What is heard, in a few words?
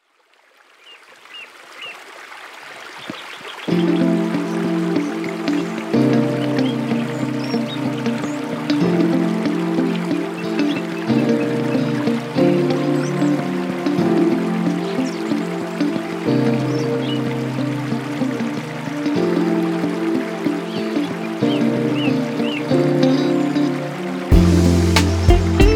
Chillhop Guitar Mellow